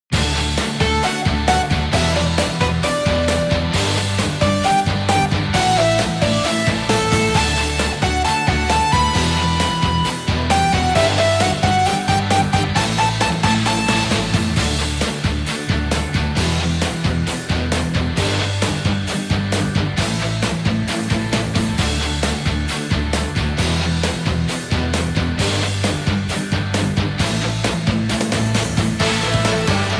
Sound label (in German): voll genial playback